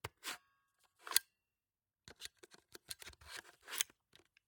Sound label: camera battery compartment open close eject slide slide in click; fuji; Sonniss GDC